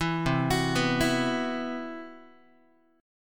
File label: C Major Sharp 11th, C, down, Guitar; arpeggiated; CM#11; 7,8,9,9,8,x